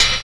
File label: hi-hat; Univers Sons - Kitch 70s